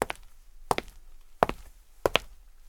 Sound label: footsteps hard